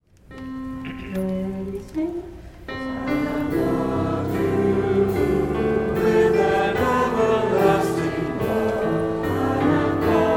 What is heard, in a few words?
refrain and a verse; Gather Hymnal